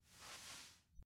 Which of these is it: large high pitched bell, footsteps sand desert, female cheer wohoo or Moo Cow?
footsteps sand desert